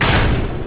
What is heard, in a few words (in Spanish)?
plataforma salto; cstrike; deathrun taringacs portal